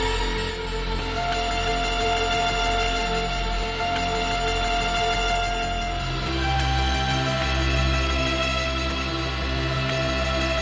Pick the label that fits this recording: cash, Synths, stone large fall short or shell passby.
Synths